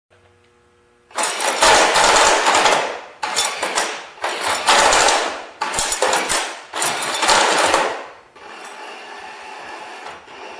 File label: spur brake, robot